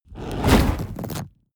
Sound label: whoosh fire lava wave small